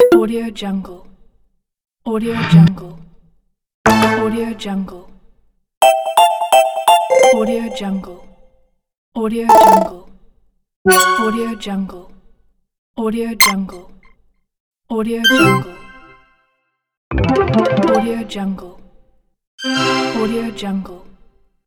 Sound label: application menu